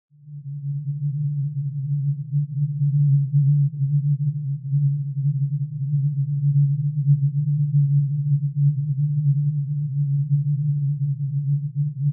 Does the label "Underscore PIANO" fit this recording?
No